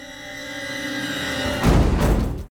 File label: LOSE